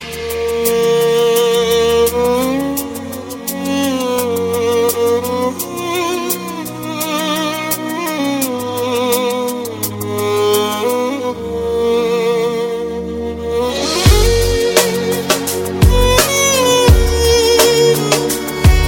Sad Violin

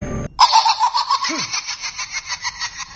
Saw

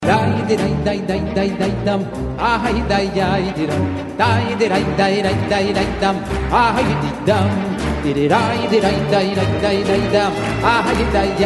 Nigun

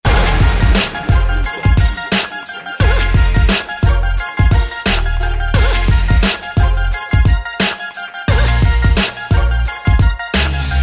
Cool Rap Beat

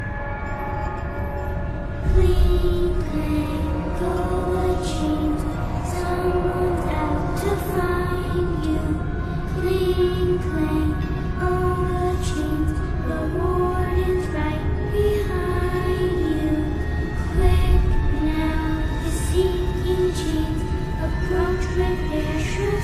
Scary Music

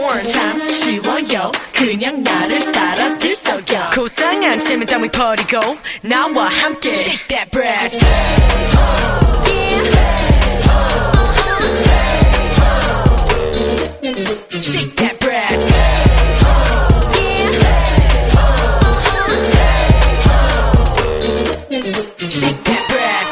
shake that brass

